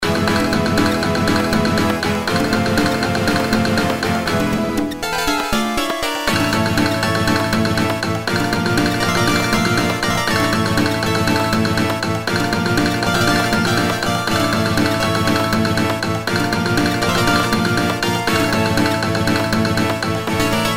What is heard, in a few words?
Spanish Feeling, ringtones; World; Euro